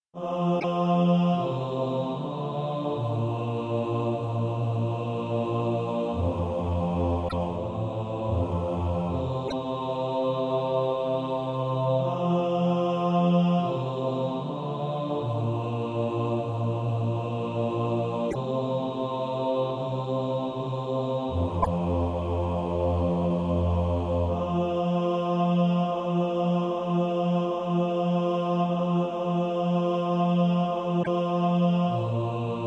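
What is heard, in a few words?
bass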